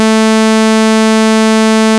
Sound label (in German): saegezahn 220